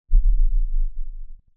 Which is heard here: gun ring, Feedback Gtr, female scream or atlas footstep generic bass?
atlas footstep generic bass